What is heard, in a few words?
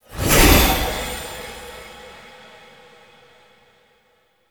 Horse Appear; Vehicles; Foley Actions; Warframe; Duviri